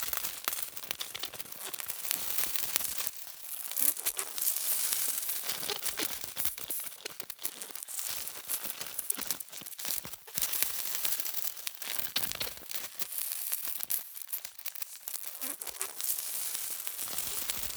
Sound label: creep approach; Counter Strike Model Pack; CS:GO; survival